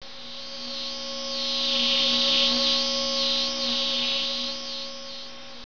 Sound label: BUZZ